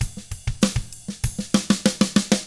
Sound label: HARD ROCK, Drums; 96HARD ROCK